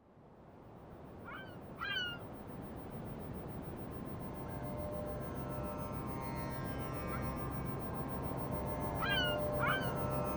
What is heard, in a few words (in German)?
ocean atmung sitar